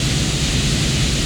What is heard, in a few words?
Radiance Big Vertical Laser Sweep Loop